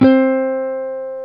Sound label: Jazz Guitar